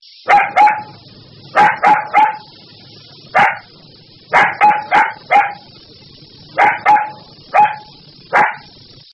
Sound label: Puppy Dog Barking